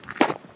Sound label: Stony Step, outdoor, Footsteps